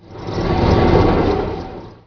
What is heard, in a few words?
construction element; Emperor - Battle for Dune